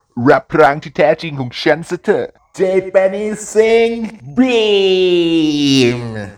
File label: beam; ff2